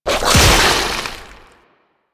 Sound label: Golem Gun Spit Attack Fire; Warframe; GolemRemaster; Plains; Attacks